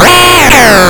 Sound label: Pterodactyl; Joust